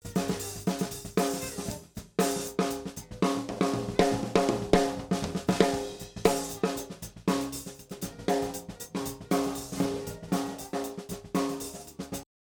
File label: Roland SC Snare Attack Comp + Gate